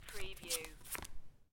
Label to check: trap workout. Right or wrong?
Wrong